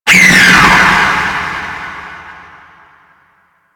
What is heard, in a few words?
Warning Screech; Enemies; DE; Warframe; Vay Hek